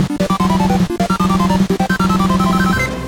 area clear; task-complete; bomberman

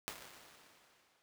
Large Hall; ir; ptpiano

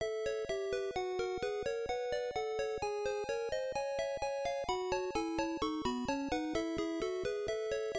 ending; chip-n-dale

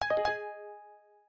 outgoing call

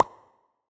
snes pop; ore-ui

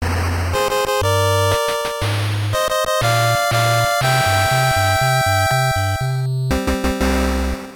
versus win; tetris

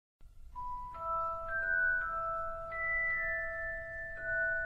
theme triumphant; harry-potter

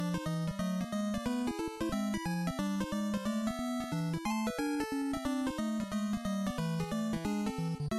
stage clear; darkwing-duck

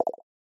Pop

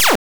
enemy attack

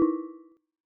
error

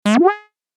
cancel refuse

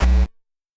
fail

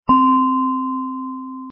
bell tone

